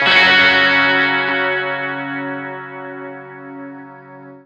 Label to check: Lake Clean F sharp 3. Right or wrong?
Right